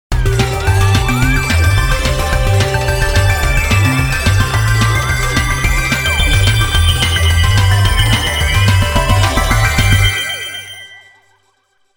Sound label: BGM Thrilling